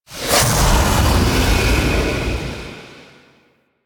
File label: Ground Slam C; Warframe; The New War; Archon; Nira; Abilities